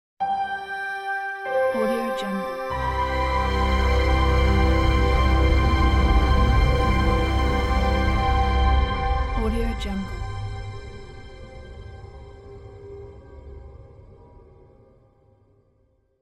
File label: hi tech intro logo; applehillstudios